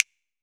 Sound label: FINGER POPS